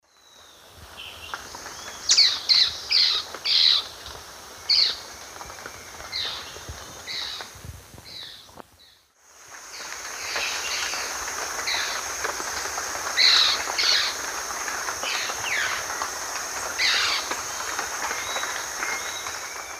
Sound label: Pithys albifrons, calls pair; S. America; Eastern Lowlands